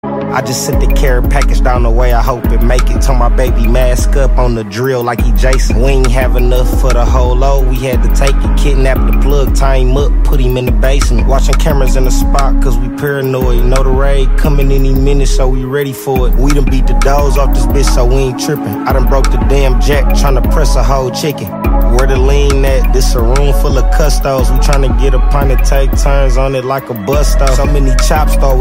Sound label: Trap, dzwonki na telefon